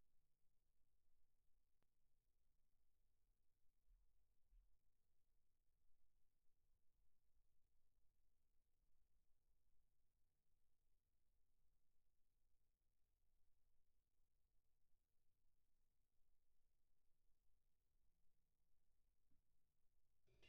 Kick In, 94bpm

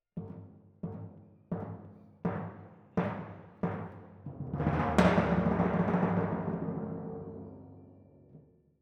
Twin F Timp

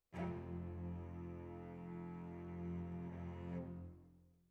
Violas, jazz for piano

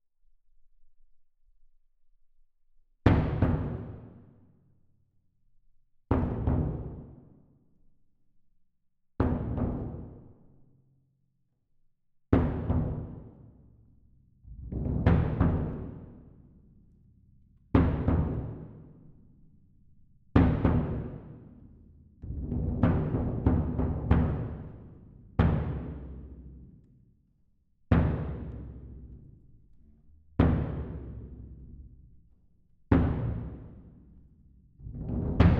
Perc

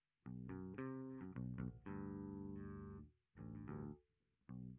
Bass Sansamp

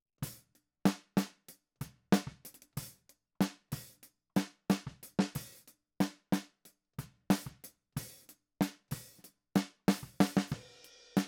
Snare

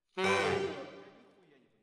ORTF SCHOEPS, piano

